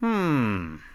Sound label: hmmm; ankh